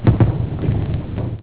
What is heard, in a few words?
fireworks; newyears